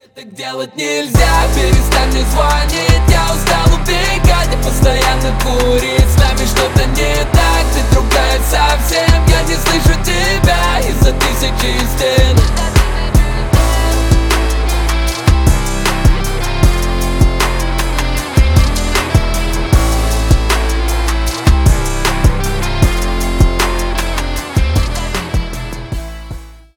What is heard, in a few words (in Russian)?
Рингтон